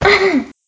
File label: grunt female